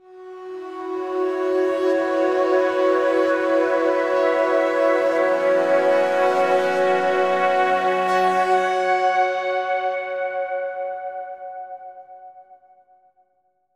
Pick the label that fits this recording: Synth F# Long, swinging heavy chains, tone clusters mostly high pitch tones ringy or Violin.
Synth F# Long